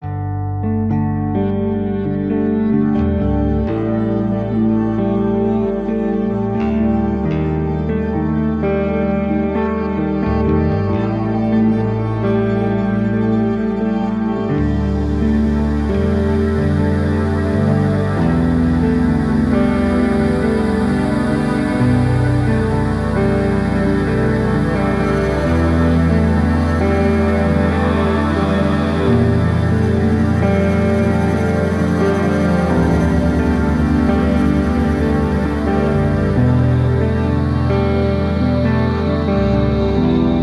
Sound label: Spacey Dreamy Chill Slightly Dark with bass; CUES for film TV games etc